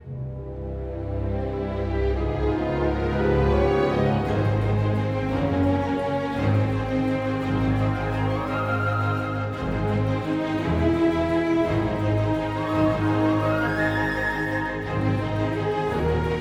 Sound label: 85bpm 3-8meter; Tailo Village D